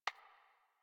UI Theme Focus; DE; Warframe; Zephyr

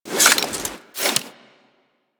Unequip, Weapons; Warframe; Tenno; Soma Kama

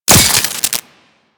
Head Shot Metal, Bullet Impacts; Warframe; Weapons

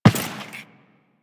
Step Default, footsteps; Warframe; Teshin

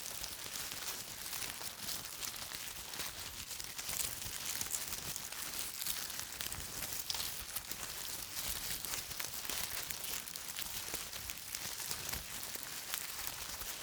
Leaf Movement Loop; Player; Entity Contact Movement

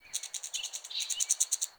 Forest Open Glade Chipmunk, Day; Ambience

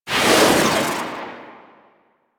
Shotgun Proj By; Weapons; DE; Warframe; Tenno; Pod Shotgun

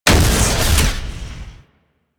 Flak Cannon Alt Fire; Warframe; UT Flak Cannon

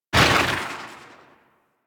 Swarm Rifle Tail; Warframe; Infested; Shock Swarm Rifle

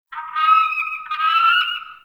Mountain Forest Predatory Bird Far

